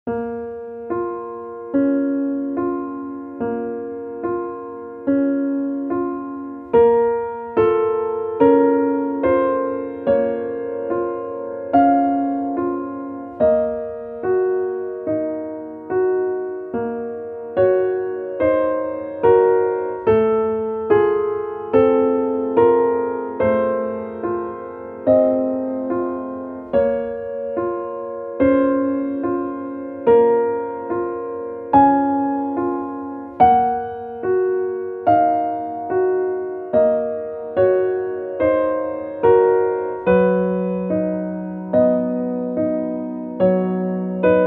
piano; 空虚 暗い

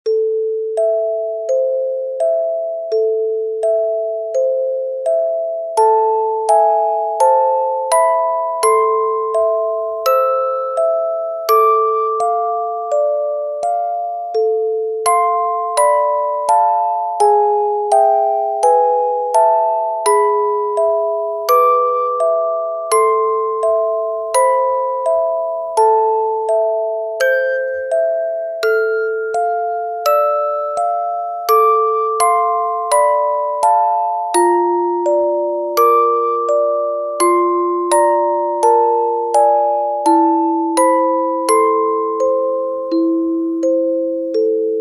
monochrome orgel

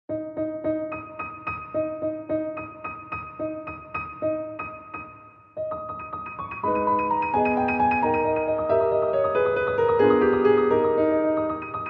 concert; liszt; lacampanella

